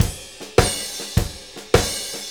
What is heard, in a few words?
Blues Loop, Drum Tools, Full Kit Loops